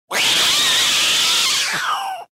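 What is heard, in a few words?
aggressive hissing of cat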